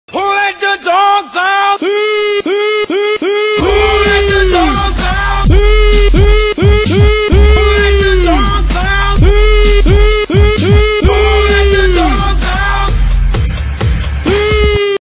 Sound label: Siuuuu siu siu siuuu